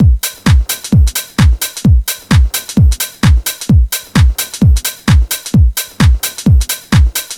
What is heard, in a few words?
Full Drum Loop